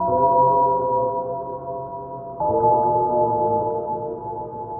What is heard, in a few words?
vibes; Sad